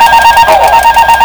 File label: A320 stall, B747; Aircraft; FS9; PF2K